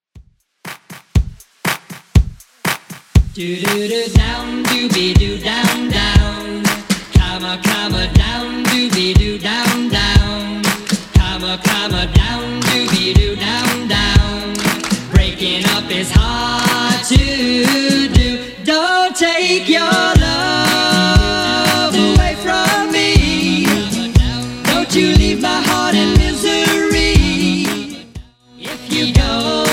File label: 60s Redrum 120bpm